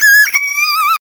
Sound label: VOC, Drum & Bass, 160 BPM; Ueberschall; Drum & Bass Flash Trackz